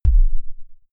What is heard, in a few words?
sub kick noise short